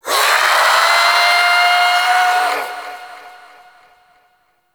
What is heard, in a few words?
Shadow Vocal Idle; Warframe; Duviri